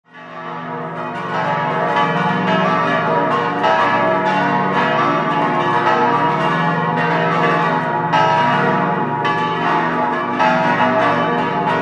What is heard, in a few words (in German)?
Gangkofen, Glocken